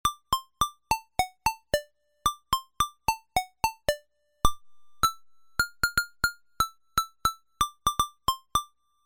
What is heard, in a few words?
micro cosm pizzicato